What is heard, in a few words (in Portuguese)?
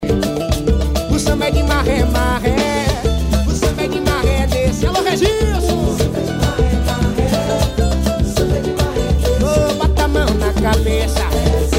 samba